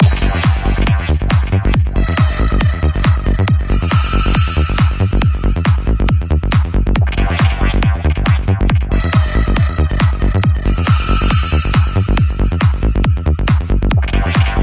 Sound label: PROG PSY KITS